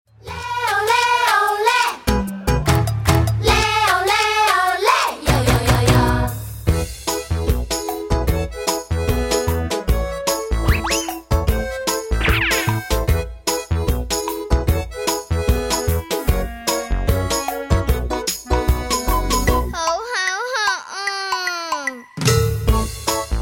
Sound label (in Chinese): Karaoke, 兒童詩歌